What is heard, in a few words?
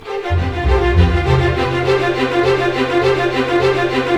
BIKE; East West; Scoring Tools; ORC; Bike Ride